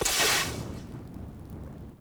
Melee Slam Sparks, Ground Stab; Warframe

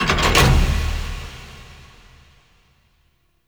Cylinder Start; Warframe; Entrati; Vaults

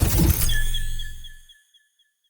Recall Start; Railjack

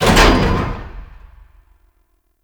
Elevator Enemy Stop; Jade Shadows; Ascension

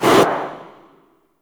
Photobooth Enter Free Camera

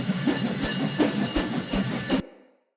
Sword Throw Loop Recall; Weapons; Player; Swords; Fenn Jotar Sword

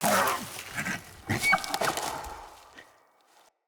Sit Spawn Fawn Run; Dendria

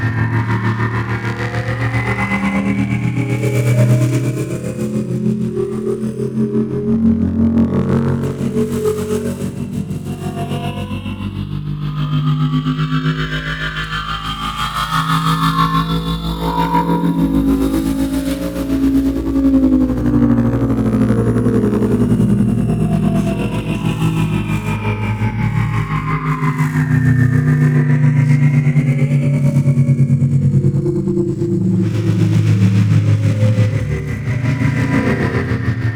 Nimble Ship Rotation Loop; Alternate Engines

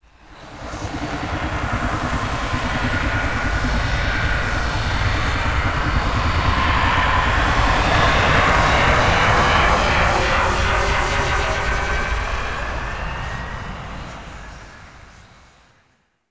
Mountain Pass Drop Off, Vehicles Flight; Warframe; Liset; Stalker Liset Skin

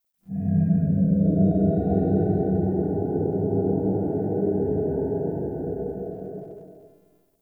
Exterior Distant Calls Angry; Ambience; Warframe; Duviri

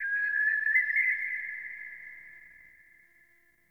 Birds High; Ambience Plains Landscape; Warframe; Eidolon Plains

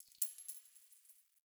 Coin Drop; Warframe; Nineteen Ninety Nine

